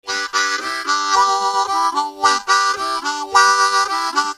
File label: Effects, harmonica